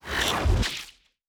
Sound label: Synth Whoosh, Sci-Fi Sounds Movement